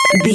retro collect pickup item

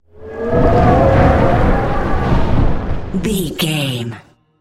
wind blizzard storm spell heavy

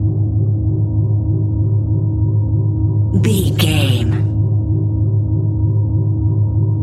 sci-fi force field hum loop

